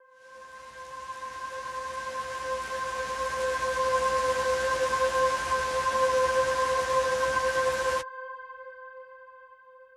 aetheric solo pad

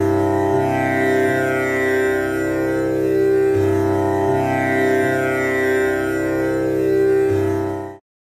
tanpura drone f monaural

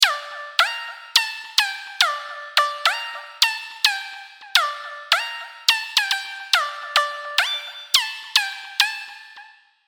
serum plucks; chiqui audio

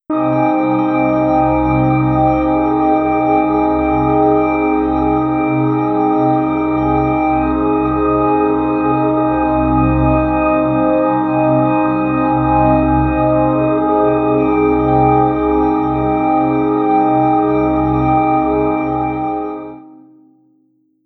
calming reflection

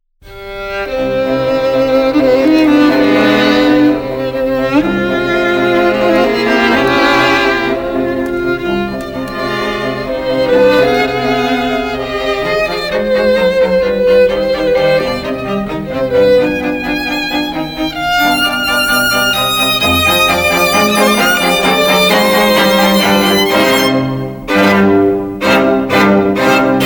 beethoven strings

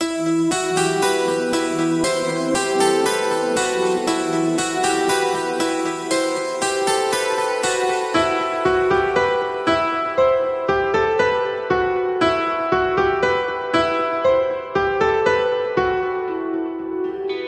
pianos

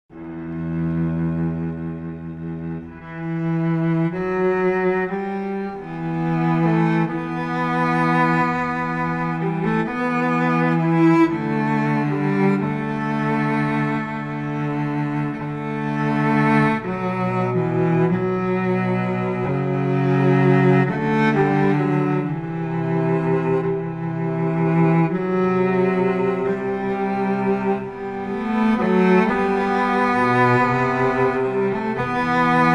modern and romantic cello

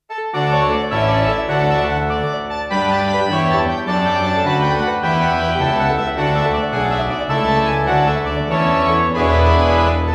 tuba tune in d maj

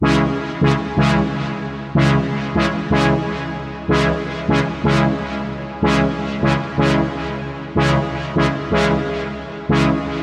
detroit techno loop

